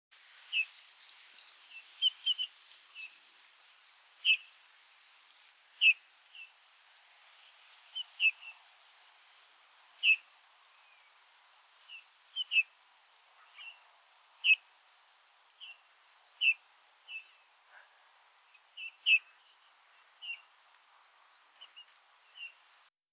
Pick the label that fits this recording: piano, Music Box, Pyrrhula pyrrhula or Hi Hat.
Pyrrhula pyrrhula